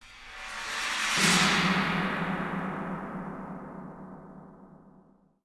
japan gong scrape; Hellboy The Science of Evil

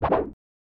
SFX Swipe

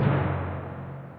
Tymp

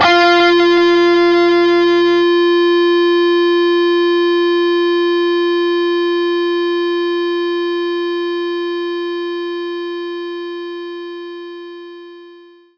Overdrive Guitar F4

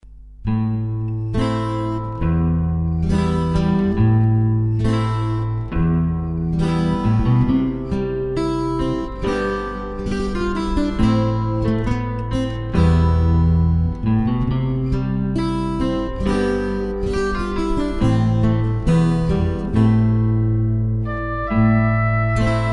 Folkmelody; beltion beyond ritual

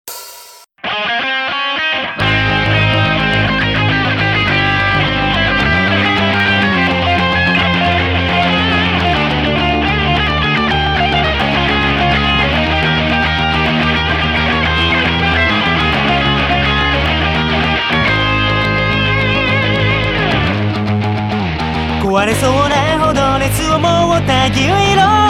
Drum Minus Track

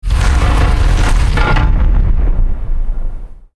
Ruins Temple Shell Rotate

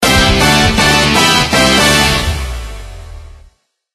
Jingles; otome the exorcist

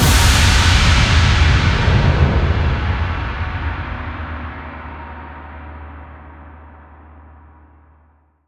Pterosuar transition

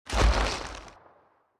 tree Large Impact; gamerip; Out of Reach: Treasure Royale

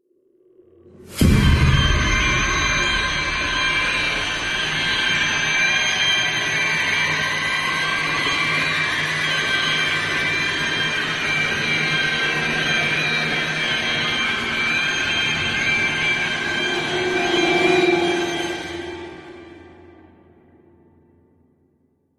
Creepy; Dark Void; ps3; xbox 360

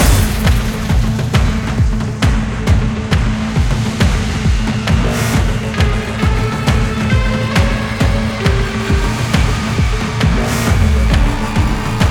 Combat Boss Loop; Turbo Shot

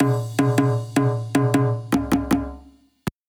End level transition; Rangi; Android; PS4

